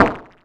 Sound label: SQUISHY BLOCK, synth perc; Zero-G; Ambient 1